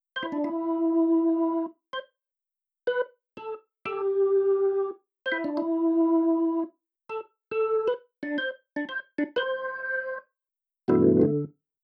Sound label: Organ